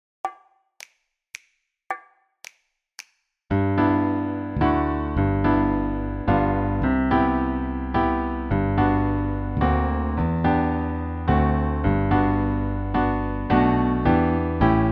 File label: Away in a Manger accomp